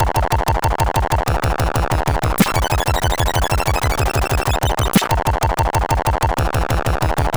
note, CSG8580R5

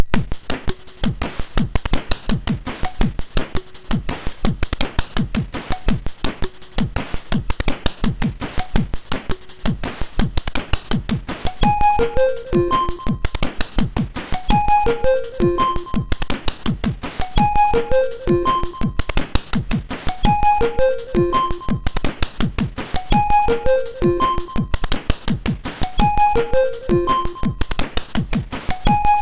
sid MOS 6581R2

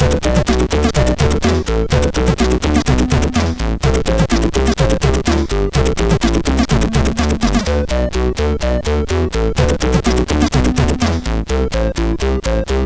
Sounds, sid MOS6581R4; HVSC; BSP-Sounds

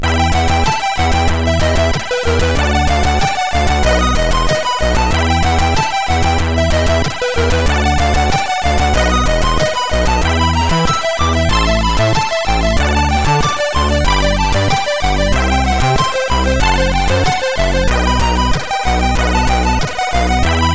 Magic Flute, sid MOS6581R4

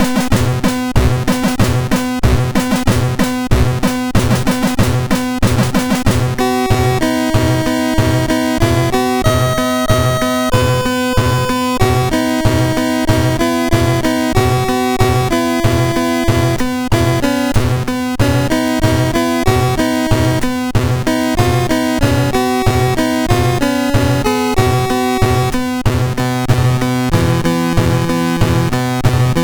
Military MOS6581R2; Mermaid

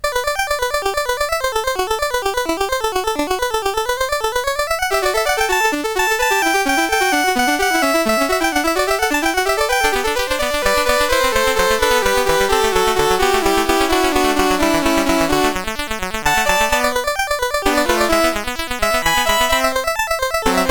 Fughetta CSG8580R5

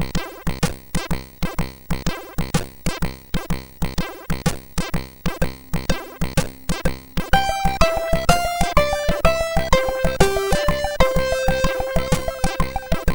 sid, MOS6581R2; Total Refill

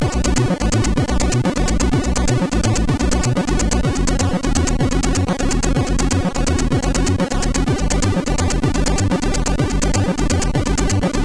Strike Force, MOS6581R4; hvsc

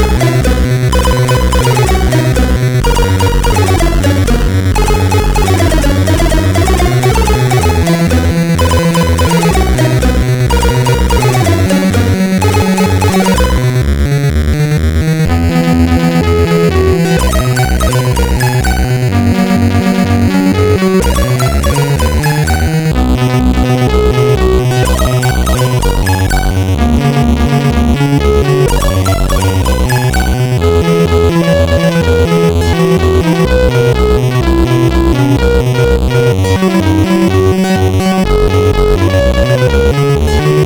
Riffsinus, sid MOS6581R4; hvsc

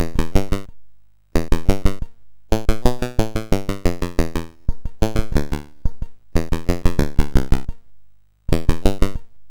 MOS6581R4, GAMES

